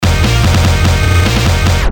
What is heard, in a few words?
Nice Separator, Breakers & Transitioners; Best Of N2O Library